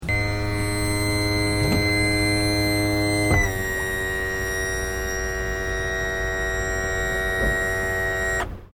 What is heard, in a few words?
Boat Small Outboard Motor Lowered